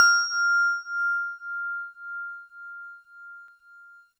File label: GVibes DX100 F6; good vibes